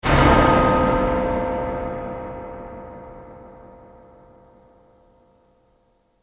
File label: Clock Tower Explosion; Bomb Sound Effects; fantasy sound effect